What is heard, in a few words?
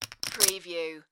Poker Chips Stacking